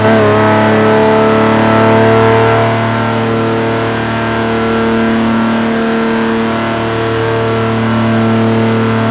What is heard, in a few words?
fourth cruise, vehicles; gmod; tdmcars; gullwing